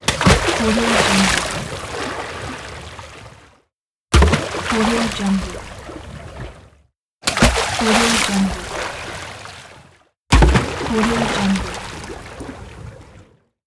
water splash

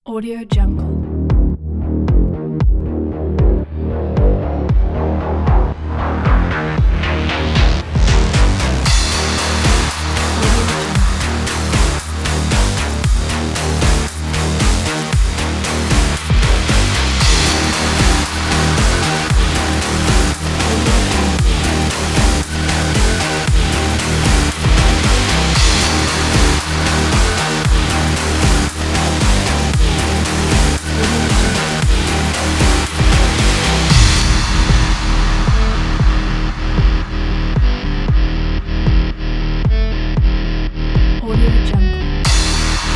action dubstep

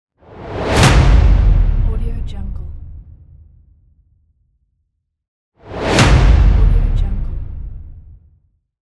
impact

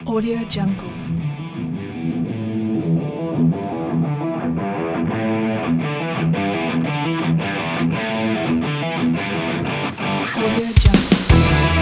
powerful upbeat rock

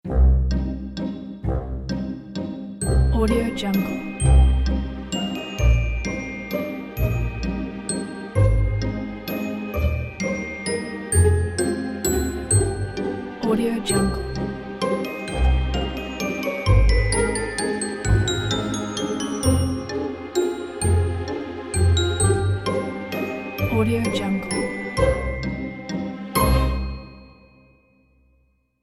sad cartoon waltz ident

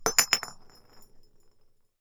steampunk weapons grenade bounce; soundmorph

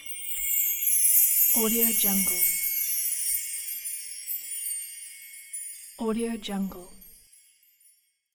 magic sparkles

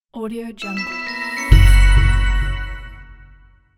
tech news logo

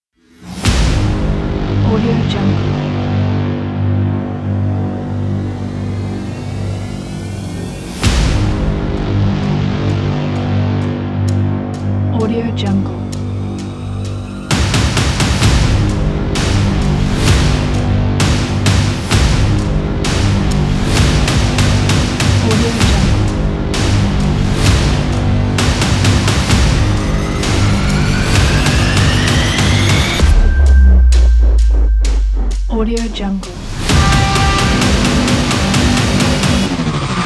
aggressive epic hybrid trailer

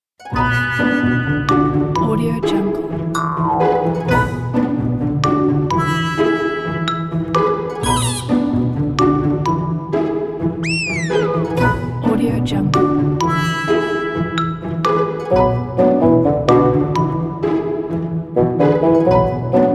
comedy music

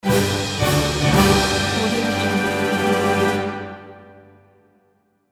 the fanfare winner; andreimodernmusic

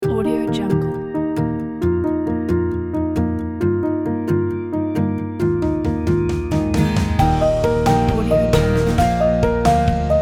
pop acoustic podcast intro logo

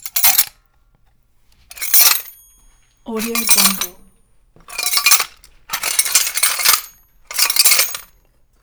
mechanism source coupler metal movements; soundmorph

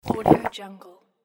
gulp

